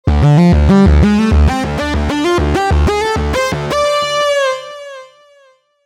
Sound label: Oberheim cream lead; pro12